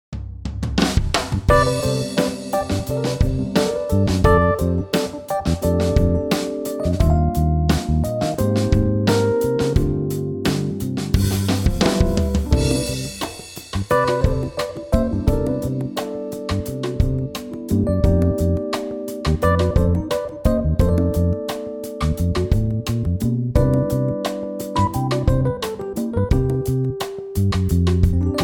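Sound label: C smooth, backing track; key - C - vocal range - E to A; Super funky smoothness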